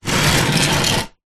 household wooden furniture cabinet small slide tile floor